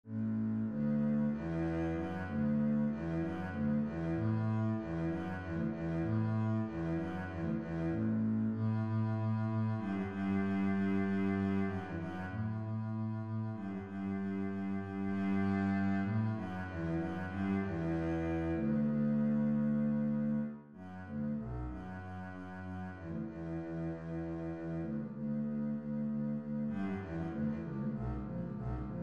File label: bass, string bass; WaterMusic